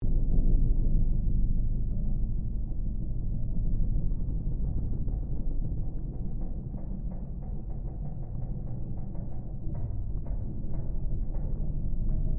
Created Ambience Ship Hull Engine Creaks Shifting Ice Loop, Created environment inside a ships hull; Created environment inside a ships hull (Spaceship or Seaship); Artificial Sound Effects